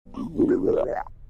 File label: stomach growl; nelvana